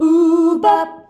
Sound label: "UUH BAB" E, FEMALES; Best Service; Hyper Dance